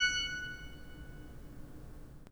PIPE, 3DOrgans

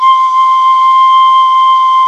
picolo C4, PIPE; AKAI S-Series CD-ROM Sound Library VOL-8

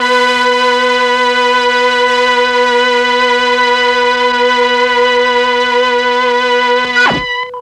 MEL STR, Legendary M400; ILIO; Propeller Island

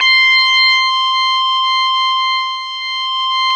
GTR, Dirty; Club-50 - Foundations; Paul-Stack

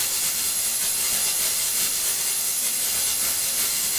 Noise Loop, sparse soundscape

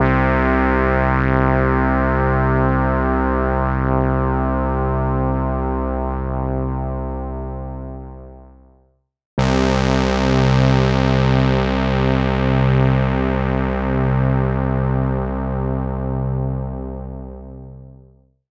EMS VCS3 Pads

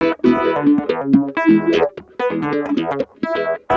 Guitar Loop; Ambient Grooves

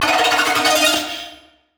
ONESHOT arp

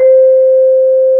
Vib Up C4, Mallets

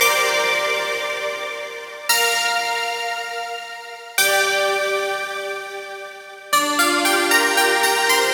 G Phrase Snappy Strings; Zero-G; Future Wave

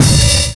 Hi Hat; Sonic Foundry (Sony Creative Software); Drums & Drones; Construction Kits; Rate Kit

